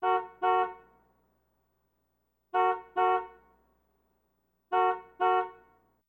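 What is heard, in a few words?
Car Horn American Small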